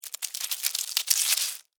Insect Run Stop, Insect, Run, Stop, Slide, Movement, Bug; Blastwave; Animals:Insects